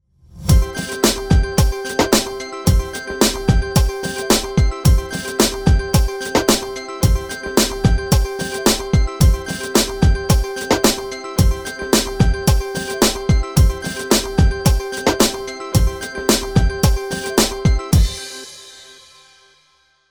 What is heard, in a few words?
BED Simple N Happy